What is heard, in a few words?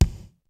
kicks snare, kid; Kid Nepro; Elite; KICKS SNARES